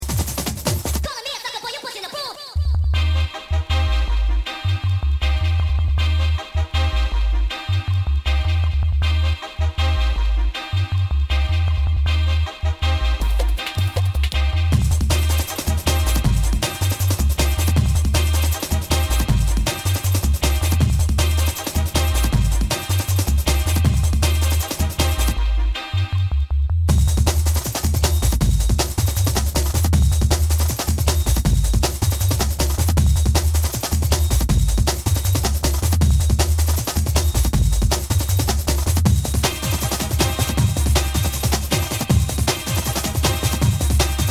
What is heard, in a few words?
Hardcore Piano